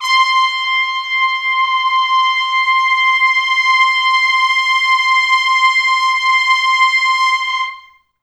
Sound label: C5 -L, Pop Brass; Best Service; ProSamples vol.19; AKAI